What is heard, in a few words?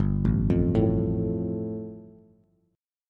G 7th Suspended 2nd G, Bass; arpeggiated; JGuitar